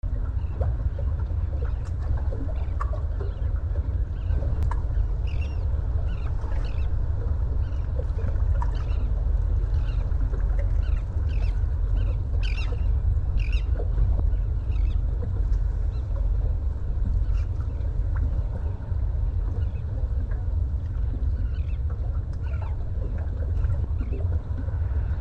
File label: harbor water and birds